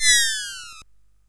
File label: Dark Castle, CSG8580R5